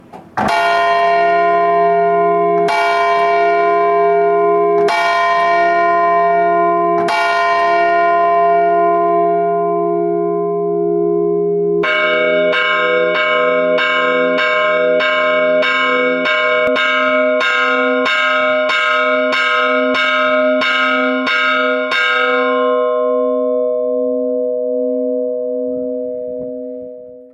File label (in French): tocsin